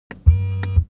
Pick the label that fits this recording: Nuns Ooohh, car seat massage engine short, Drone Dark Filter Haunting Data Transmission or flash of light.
car seat massage engine short